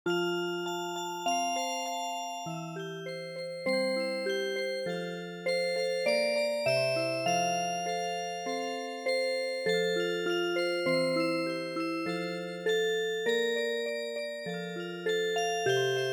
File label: Music Box, midi; Hark hark the lark